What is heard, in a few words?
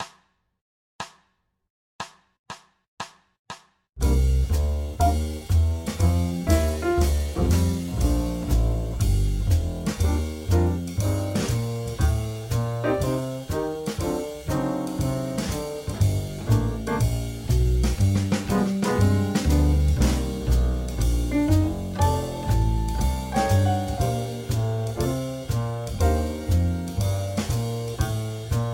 Gm 8 Bars Loop 120